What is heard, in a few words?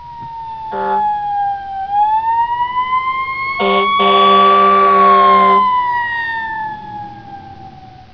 fire truck